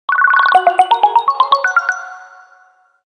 La fleur bell; Samsung Galaxy S4 mini LaFleur

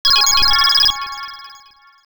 Chirping tone; Samsung SGH-E500

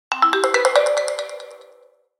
striking; HTC One-X

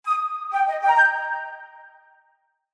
Barrel Message, Flute; HTC; Flute 1

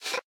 Effect Tick, ui

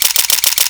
camera click; Meizu MX2

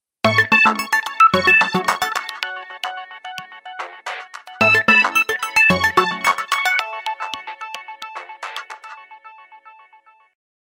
Youth Common Creamy, ringtones; Samsung Galaxy Win GT-I8552

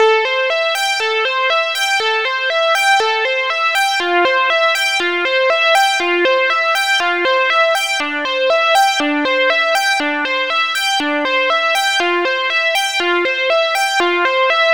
Get Up alarm; Sony Ericsson; PlusFourSix; Josephine (G700)

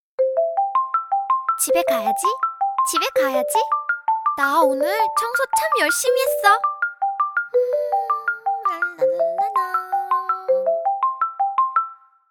Homing - Robot cleaner; LG

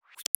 ui feedback banner

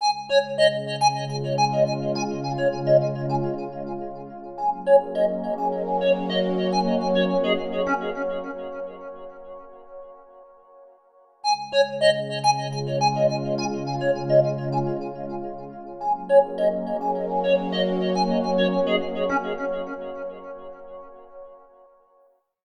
Daybreak, alarms; LG Stylo 4 Plus

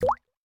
dewdrop touch down, phonetones, ui; LG Optimus G F180K

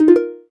gayageum, keytone

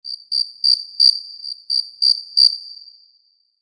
Cricket; Lenovo; A630

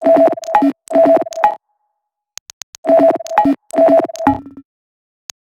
fidget; Nothing Phone (2)

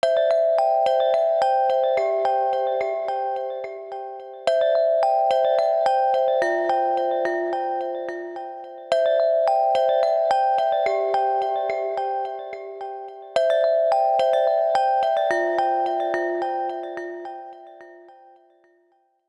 ringer garden, ringtones; Mozilla Boot2Gecko

